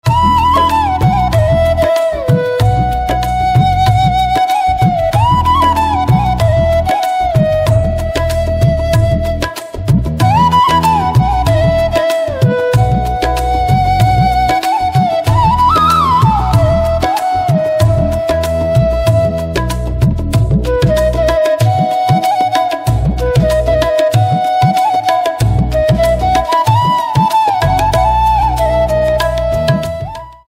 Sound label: Flute